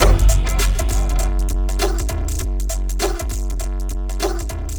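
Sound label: 100 BPM; RHYTHMLOOPS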